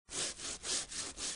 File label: scrubbing rug with brush